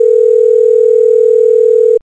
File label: telephone ring; Phone Tones